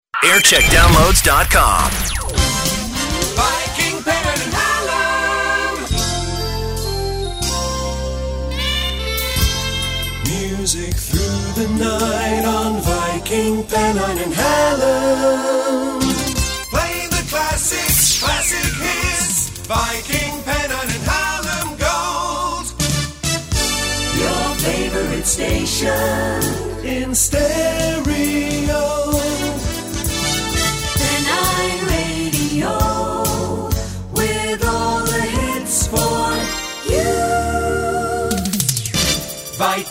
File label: Jingle Montage; Yorkshire Radio Network